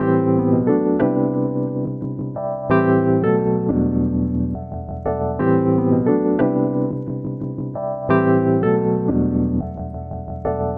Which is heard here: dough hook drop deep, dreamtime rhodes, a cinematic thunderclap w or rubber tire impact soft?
dreamtime rhodes